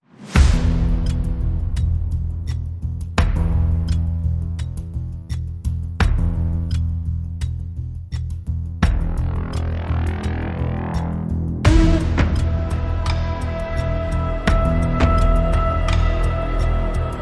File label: No Signal Alarm FX